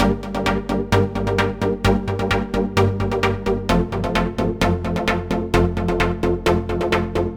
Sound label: ThirdsStabPattern; echolab